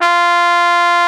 Trombone A2

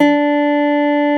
N Guitar Gb2

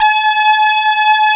Syn Brass C4

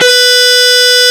Dist Gtr B2

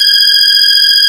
B3 Lo Dist Fast F#5

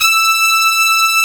Brass Gb4

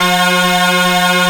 Bag Drone A1

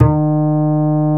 Acoustic Bass A1

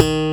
Slap Bass F1

